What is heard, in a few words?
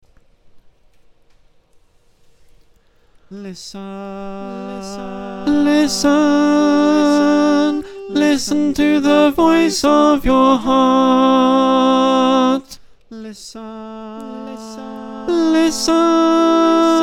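alto, choir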